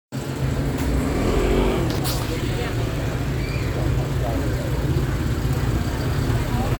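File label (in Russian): звук заведенного автомобиля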